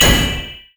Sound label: shield; LoL; lulu